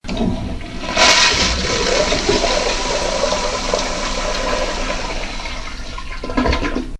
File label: Flush ring